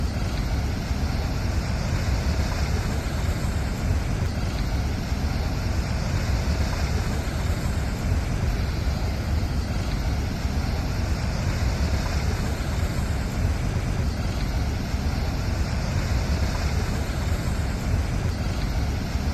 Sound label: Waterfall